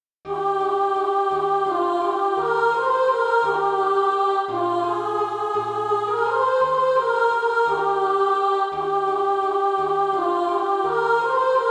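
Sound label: soprano, Practise Music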